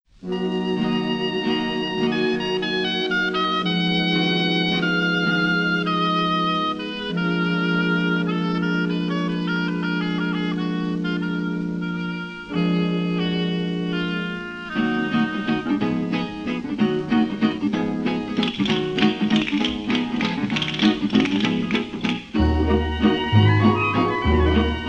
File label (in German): Orchester mit Refraingesang, spanische Dorfmusik